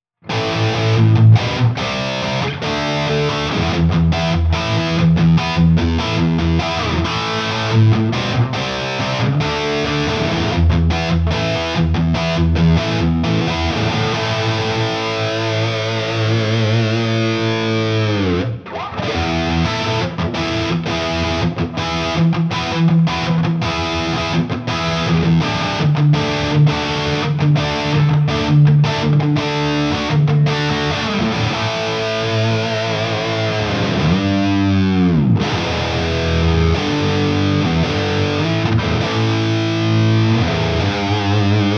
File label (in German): Gitarren Plug ins im Vergleich Guitar Rig 7 Highgain Rhythm